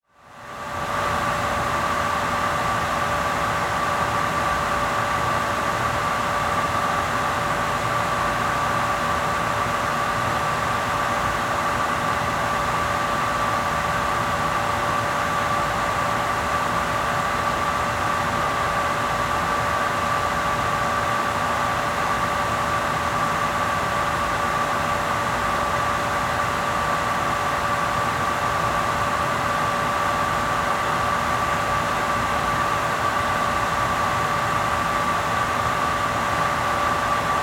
Industry Ambience Generator Motor

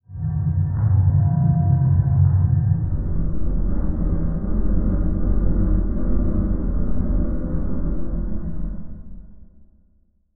Transition Stab Dark Ghostly; Production Element

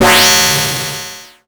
Multimedia Internet CD-Rom Flash Radio Element

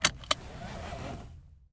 Transportation Belt Seat Belt Remove Seat Belt

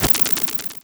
Animation Electronic; Adobe; Multimedia Internet CD-Rom Flash

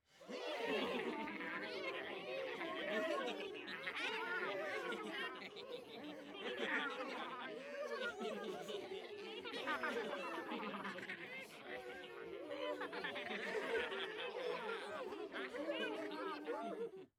Cartoon Crowd Gremlins Cartoon

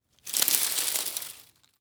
Impact Stick Drag Through Dirt And Rocks

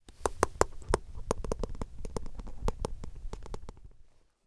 Cartoon Teeth Teeth Chattering; AdobeCC

